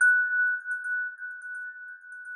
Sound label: mid chime